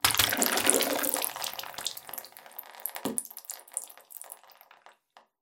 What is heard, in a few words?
xen dripping; ambient; Half Life Alyx